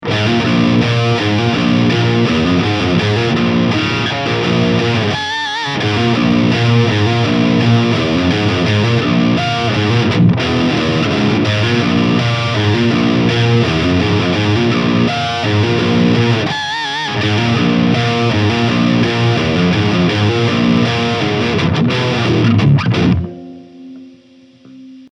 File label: Ibanez XPTB720 Lead